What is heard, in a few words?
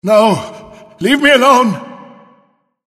river; God of War Collection Volume 2; PS3